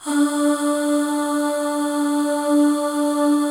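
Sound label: D3 female ahs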